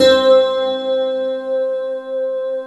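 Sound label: harp harmonium C3; HARPONIUM; Synth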